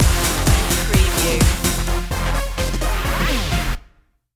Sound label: turn up the music stinger